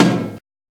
old school subtle reverb bass drum sound F sharp key